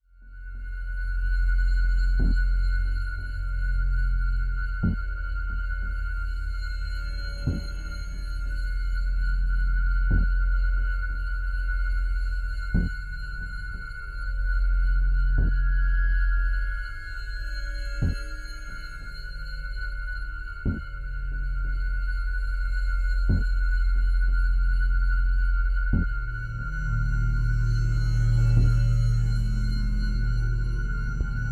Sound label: Lurker Horror Soundscape; dark ambient music